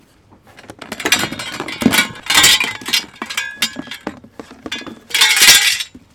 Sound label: Tent Pipes